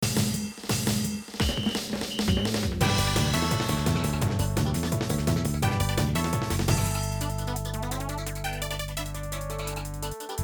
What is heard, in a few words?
Marching Orders Tempo Up; Rhythm Tengoku Arcade